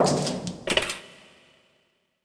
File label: checkpoint; diver jimmy